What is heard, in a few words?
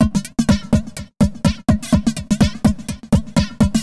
HOUSE 125